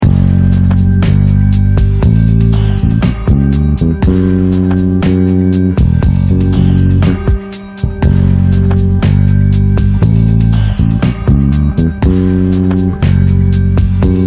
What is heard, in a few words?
Locrian Groove, bass; Locrian mode sounds against a sustained G drone; a bassist's guide to modes